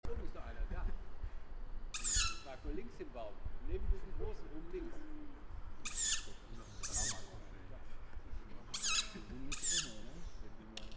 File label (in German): waldkauz